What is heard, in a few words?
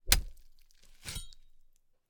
gore, large metal blade wet stab slash and remove from flesh, hard; Timothy McHugh; Gorification [HD]